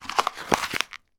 small card box squeeze and crush in hand; foley

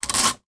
office tape sticky rip; sound effects one

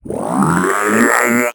Robot; little robot sound factory

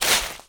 foley footstep path deep gravel

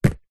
sport soccer ball catch two hands

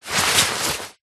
foley jacket raincoat arm body movement single; zapsplat

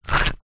leisure fishing reel turning reeling small short; zapsplat

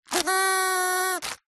party horn blow paper tube roll out; zapsplat; foley

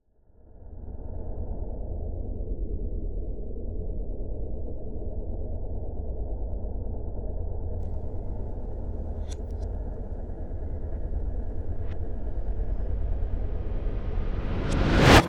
Swell Flutter; bottlerocket

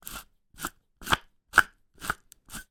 food large pepper mill turn grind

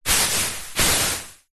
household beanbag large fine movement short

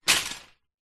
industrial cart metal fall over

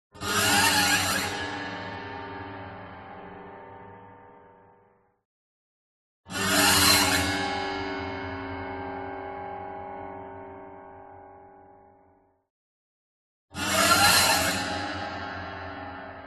CINEMATIC HORROR Piano Bow String Broken High; smartsound

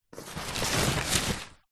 cardboard flattened many movement

